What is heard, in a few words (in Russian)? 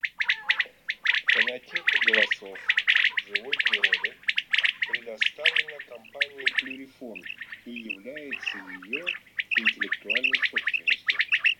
Перепел Quaglia